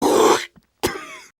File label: spitting; tf2; sniper